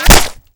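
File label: bullet impact body sharp blood stereo; Modern Warfare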